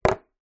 food drink empty take away coffee cup down on surface